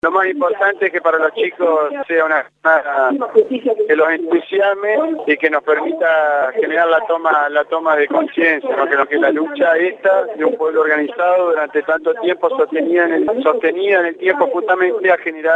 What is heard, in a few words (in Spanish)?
grito; PIAGGIO